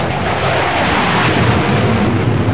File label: flyover